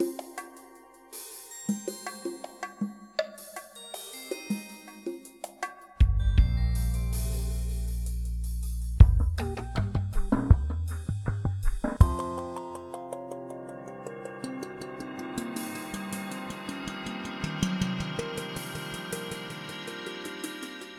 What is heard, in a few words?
ambience music